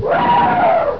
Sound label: elephant